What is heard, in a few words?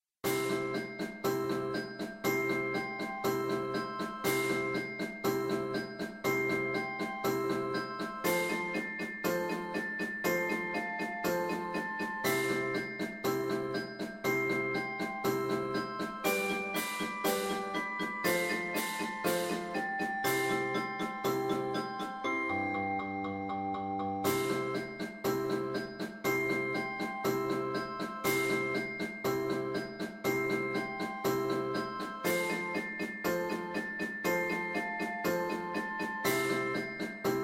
recorder